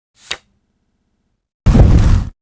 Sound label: burn card; retinz